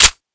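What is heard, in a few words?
slide release, usp; vp70; svencoop; ins2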